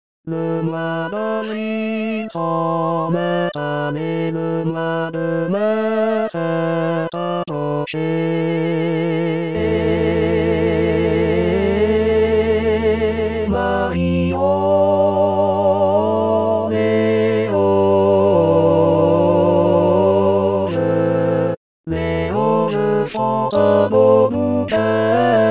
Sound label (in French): tutti; marionslesroses